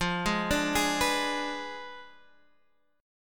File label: F Diminished 7th Guitar Standard; arpeggiated; JGuitar